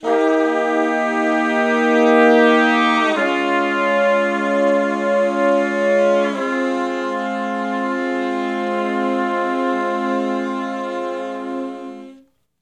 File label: Slo Minor Blus Chords (V,IV,I,I) (F), Horncraft; Sonic Foundry (Sony Creative Software); Sections